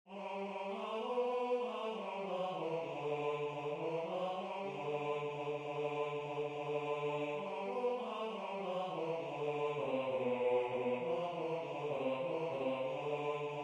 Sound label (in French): Basse; Entzun Abesbatza; Atzar Gaiten; A. Lesbordes